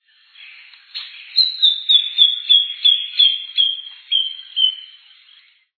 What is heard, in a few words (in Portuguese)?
vira-folha-pardo